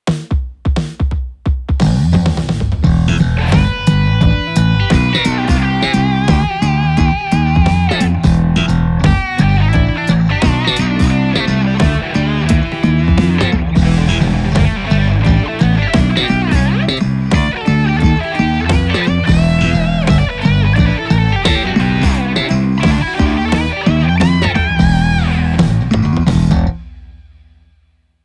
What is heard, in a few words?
Slap; Alusonic Custom Shop JSP DX 4 NT BL